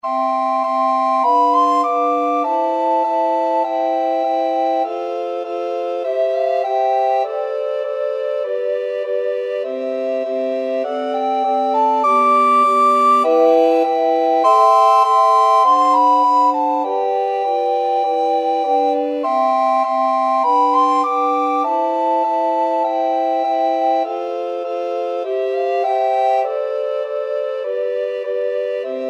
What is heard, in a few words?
land of hope, recorder quartet